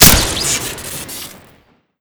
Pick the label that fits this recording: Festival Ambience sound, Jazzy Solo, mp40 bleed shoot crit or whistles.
mp40 bleed shoot crit